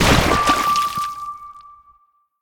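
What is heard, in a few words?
Power Vomit Land; smite; god bakasura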